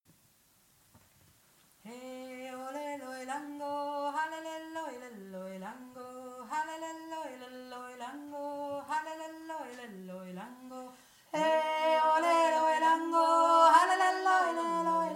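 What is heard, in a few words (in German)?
yoik halele hohere stimme, jodler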